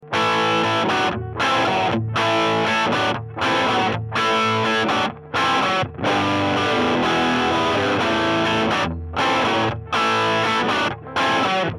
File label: p90 chocolate bar bridge, guitars